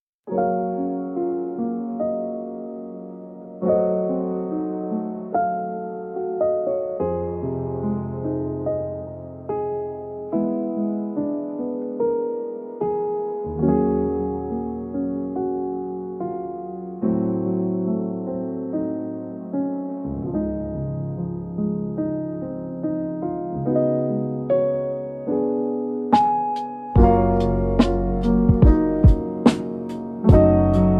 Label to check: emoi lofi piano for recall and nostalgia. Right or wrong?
Right